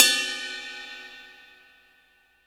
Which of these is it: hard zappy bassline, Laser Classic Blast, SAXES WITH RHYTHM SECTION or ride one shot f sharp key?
ride one shot f sharp key